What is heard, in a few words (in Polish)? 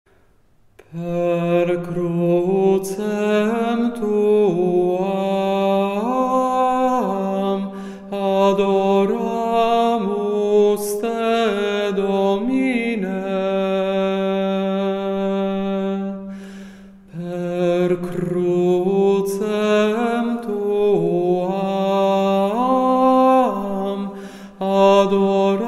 tenor; Per Crucem tuam; Wielki Czwartek; spiew na triduum